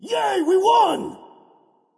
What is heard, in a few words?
yay; smite; voicepack; hou yi